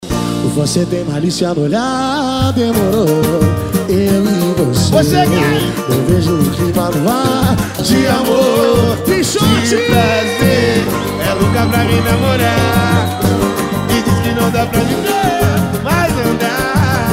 pagode e sertanejo; xtreme sound

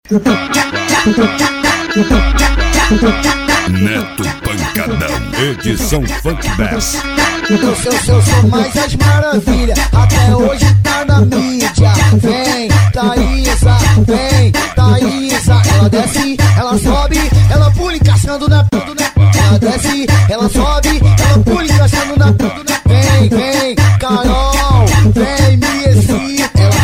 funk bass remember

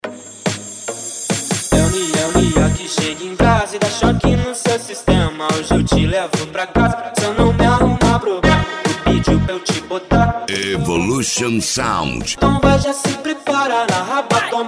esp de pancada

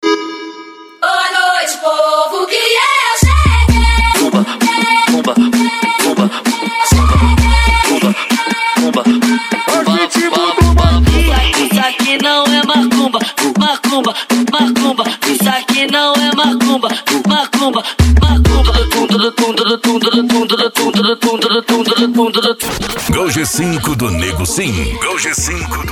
funk mega bass e noia dance

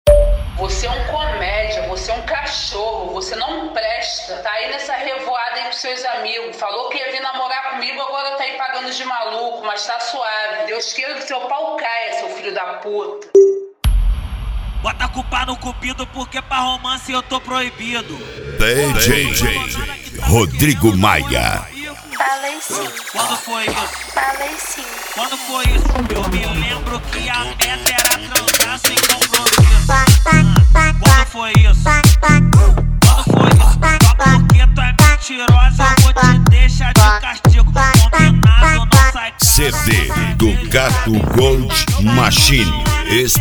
mega funk

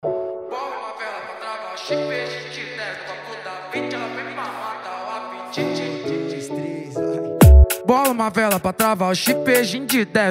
esp de cavalgada

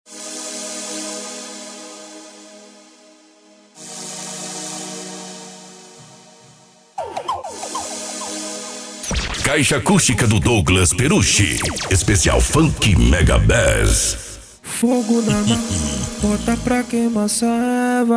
especial funk mega bass

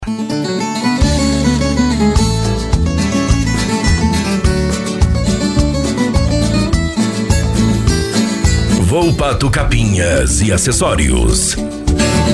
pagode de viola

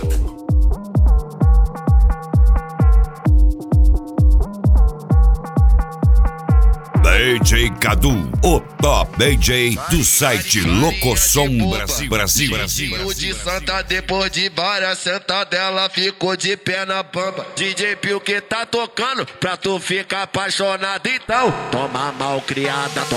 mega funk; set mega funk vol2; especial mega funk vol2 agosto

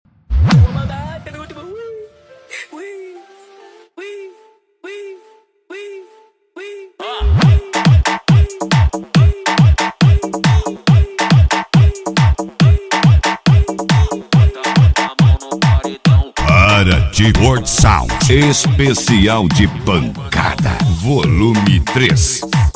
esp pancada

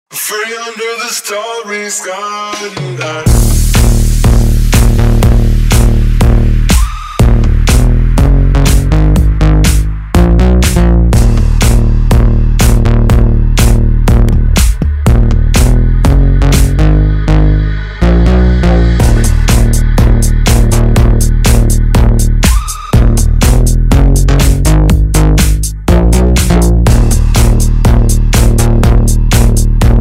energy vibe eletronic music

